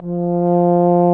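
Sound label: brass, french horns; Roland - LA Composer vol. 1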